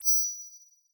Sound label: notify; Scrat